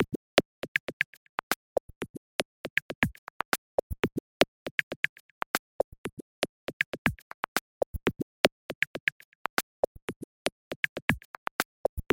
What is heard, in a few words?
soft beat